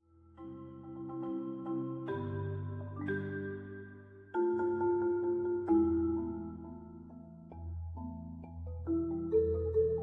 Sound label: xylos; falla